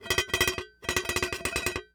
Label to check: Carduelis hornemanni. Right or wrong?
Wrong